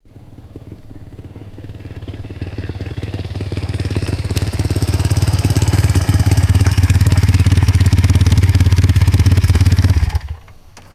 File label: vintage motorcycle approaches stops idles and shuts off